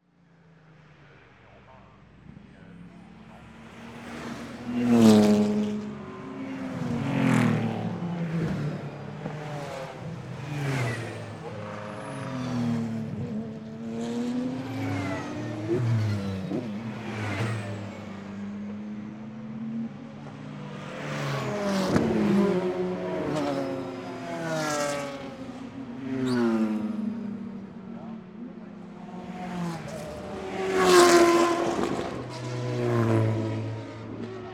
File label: safety car lap, race cars; Le mans; Chupapsound; Sounds of Le mans (Race Cars)